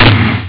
tomtom; Amiga Soundtracker sample

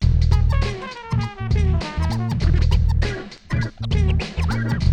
99BPM, Hip Hop; InVision Interactive; 40 Oz Phat Slammin

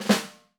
RUFF, 30S JAZZ; Northstar - New Gold VOL-1

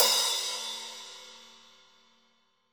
LIGHT, ROCK CRASH

